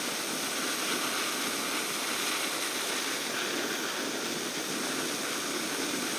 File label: Heldbeat Loop Fuse